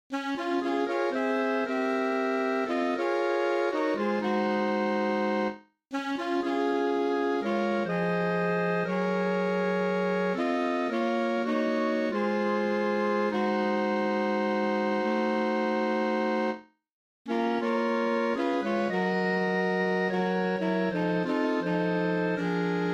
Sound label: Youth Upper Voices